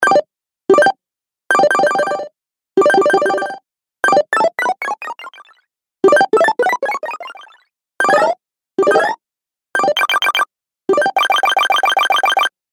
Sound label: Retro Videogame Fx 10 Sounds Pack